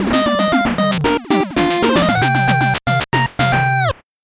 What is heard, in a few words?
8bit jingle slow